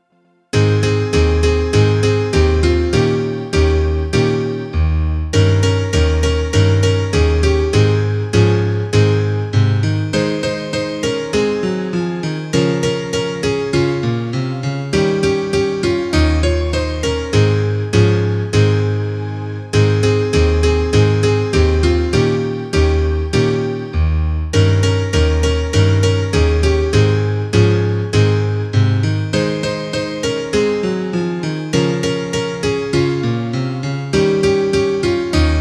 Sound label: instrumental